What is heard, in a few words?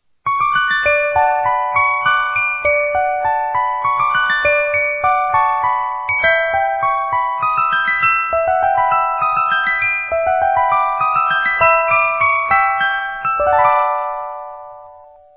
FASCINATION WALTZ; a strappo